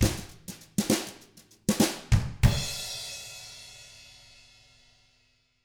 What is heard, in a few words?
FUNKY, Drums